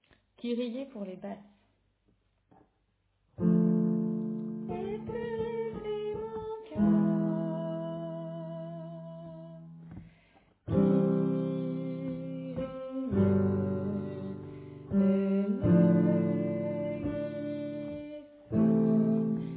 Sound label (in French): Kyrie Basse